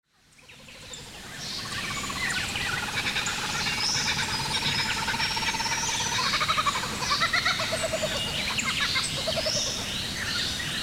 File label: Kookaburras